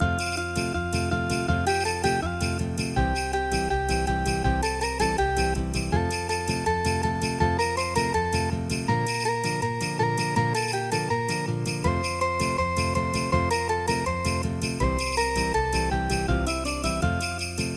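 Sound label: hige flamenco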